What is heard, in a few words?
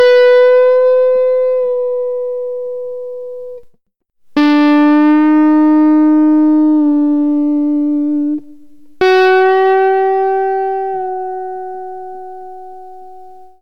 blue notes